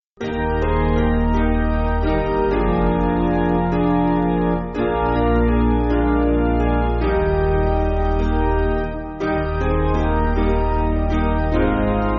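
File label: Piano, Backing Files for Churches; Eb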